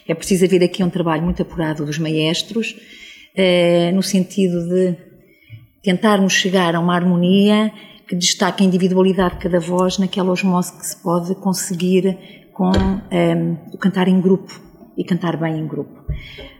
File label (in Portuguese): CORO